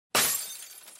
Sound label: glass; minigame